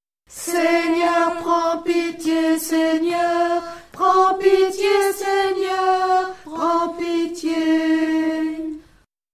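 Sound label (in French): Seigneur Prends Pitié Alto, chœur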